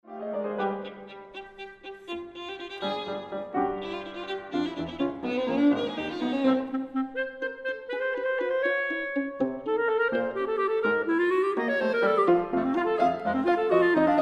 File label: Suite for Trio Scherzo Allegretto; Arutiunian